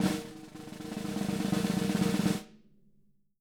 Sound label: SN ROLL, SD ROLLS, Living Drums; Sampleheads; Peter Erskine